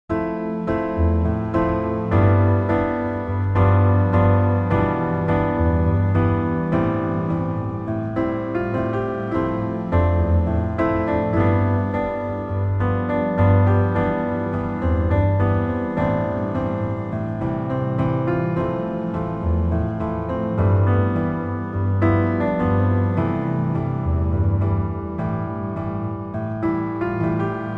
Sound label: piano